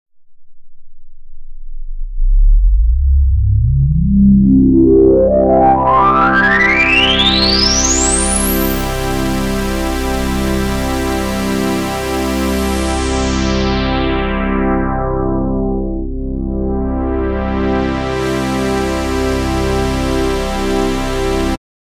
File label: metal, Volcano; plugin filtre; fabfilter volcano2